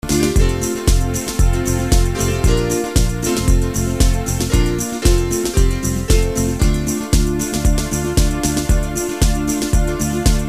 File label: Music Swim